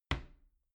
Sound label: door wood close soft